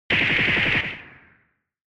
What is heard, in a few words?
Retro Anime Gun Short